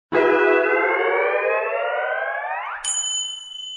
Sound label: goes up; Monkey Banana Jump